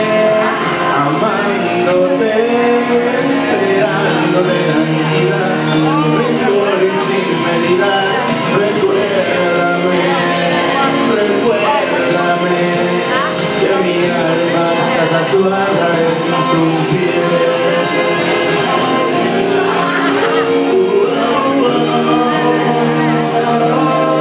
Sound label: sings karaoke at hombres lounge